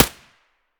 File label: aps silencer mono distant; STALKER; IWP